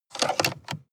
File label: sports car int drink holder close hard short; verkehr-transport